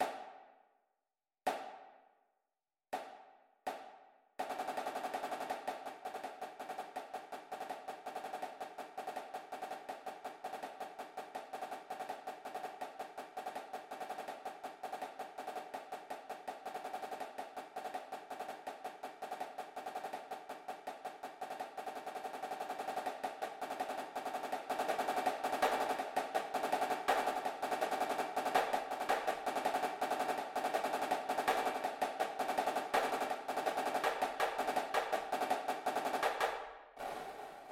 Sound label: snare, drumline